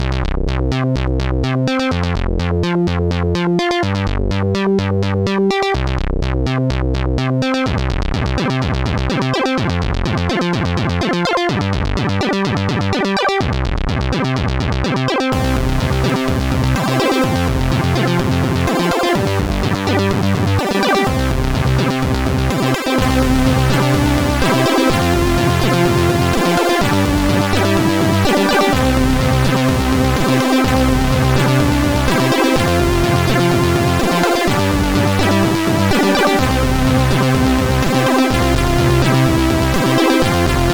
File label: Zound Muzak, sid CSG8580R5